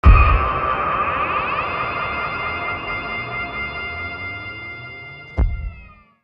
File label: Stinger; Duke Nukem Forever